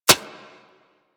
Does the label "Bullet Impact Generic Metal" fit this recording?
Yes